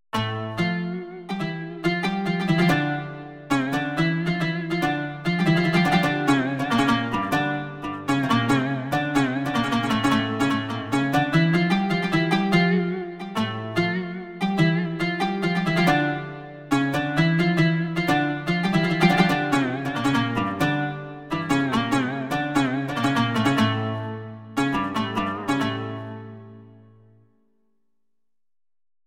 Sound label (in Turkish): ud kanun